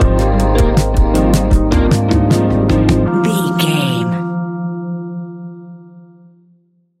A relaxed and chilling piece of music; A relaxed and chilling piece of music, great for light documentaries, background music for tv shows, lift; stinger

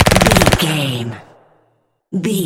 gun submachine auto shot automatic

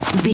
footstep snow run

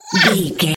goblin fairy attack low

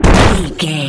rock impact spike trap

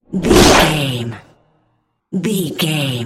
bullet fly by designed

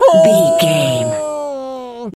wolf hurt

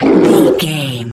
troll monster battle groan

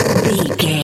radio tv electronic static

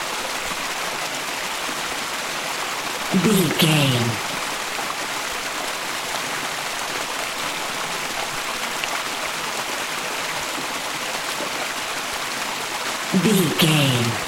river stream flowing water loop long version

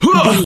voice male attack groan

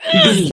voice female hurt pain

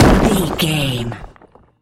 rock smashable hit impact large

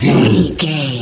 troll monster attack slow

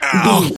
voice male hurt pain

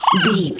goblin fairy attack fast

